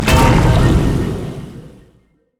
tidal wave; nami koi; LoL

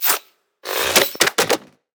emote recall; LoL; thresh; undersea

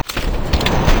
critattack; LoL; janna forecast

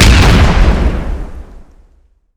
q attack; LoL; jinx base

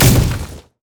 zone knockup; Zyra; LoL

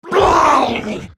spellcast effort

